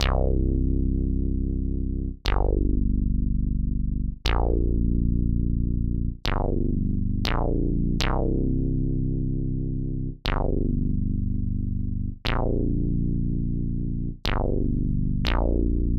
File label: JX 4 bass with